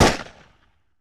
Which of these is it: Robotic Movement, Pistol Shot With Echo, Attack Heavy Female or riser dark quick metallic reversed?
Pistol Shot With Echo